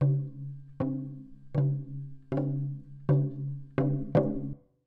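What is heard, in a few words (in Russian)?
буддийские звуки ритмичный приглушенный активный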